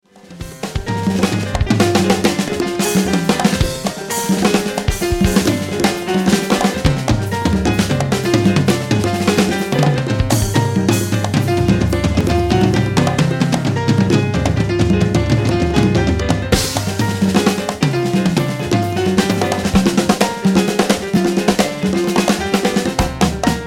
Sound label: Tango